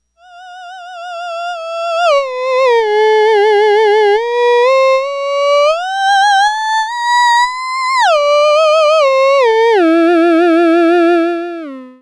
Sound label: ews new board, theremin; ews buffer exciter